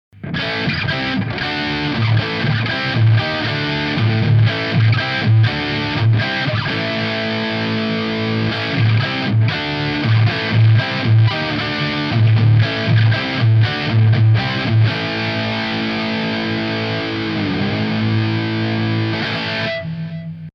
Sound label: Lead